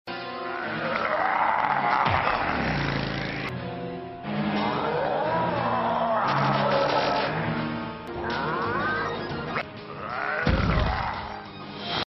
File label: roars